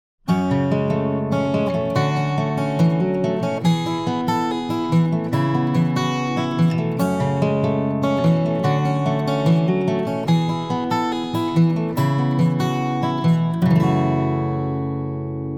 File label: guitar AG finger pick